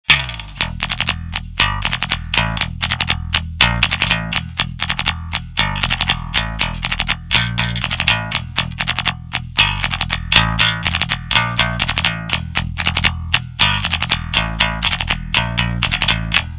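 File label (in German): extreme Höhen Mitten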